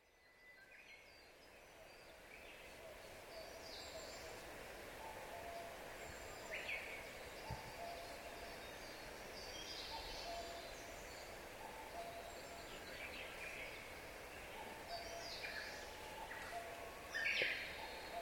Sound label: chorus